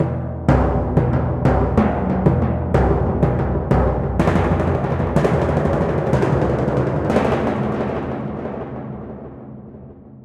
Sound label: Timp